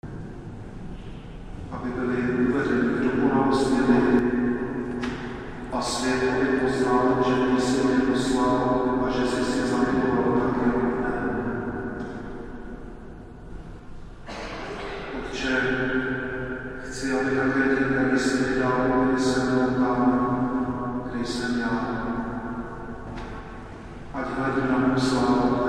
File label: religious european ceremony